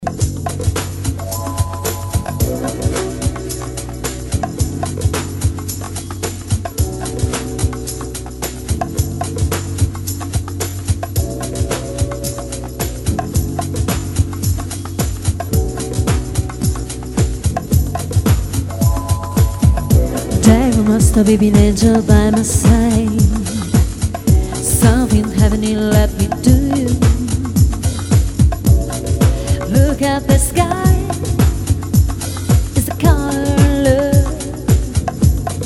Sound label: LOUNGE